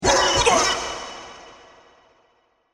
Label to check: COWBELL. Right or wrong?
Wrong